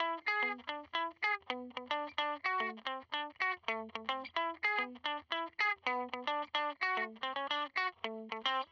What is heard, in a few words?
Electric Guitar, Loops; Guitars